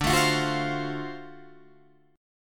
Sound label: D 7th Sharp 9th D down Guitar Open D